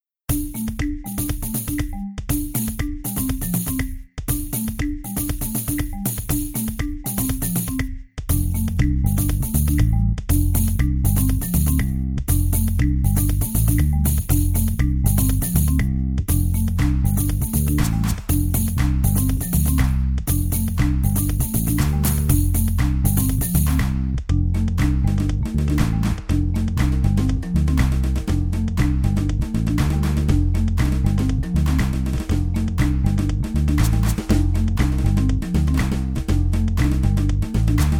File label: bossa